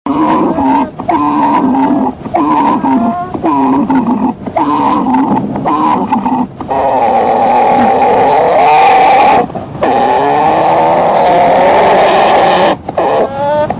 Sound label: Alpaca Orgle With Baby In Background; What Sounds Do Alpacas Make?